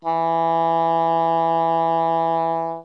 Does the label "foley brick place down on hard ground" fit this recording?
No